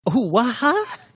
hoo whahah

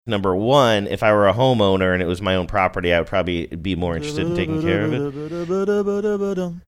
singing portion; saying number one if i were homeowner

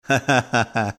light chuckle

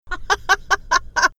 chuckling

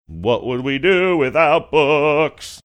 singing what would we do without books

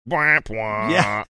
bwaah pwaah

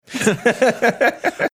chuckling

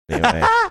funny laugh

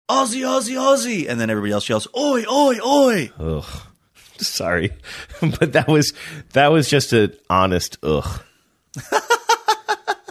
chanting aussie aussie aussie oi oi oi

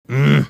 grunting

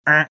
making buzzer sound

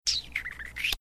making mouth sounds

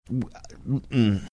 making funny sounds

